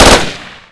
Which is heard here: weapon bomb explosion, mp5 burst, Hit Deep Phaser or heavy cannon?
mp5 burst